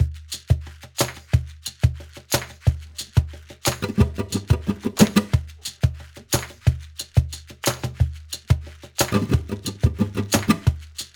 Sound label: saints add melody